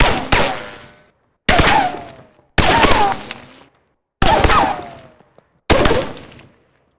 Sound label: Bullet Ricochet; Sound Ideas; Guns